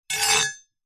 household kitchen knife large blade loose scrape on solid surface; zapsplat